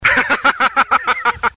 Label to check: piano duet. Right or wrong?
Wrong